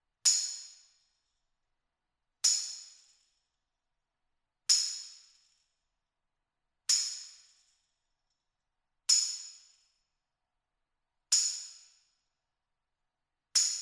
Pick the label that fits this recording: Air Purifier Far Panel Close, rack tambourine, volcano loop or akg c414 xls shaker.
rack tambourine